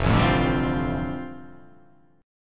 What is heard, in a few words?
F 6th Add 9th F down, chord